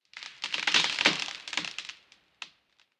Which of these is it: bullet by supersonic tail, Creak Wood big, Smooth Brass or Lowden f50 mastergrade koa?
Creak Wood big